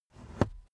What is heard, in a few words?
car headrest full adjustment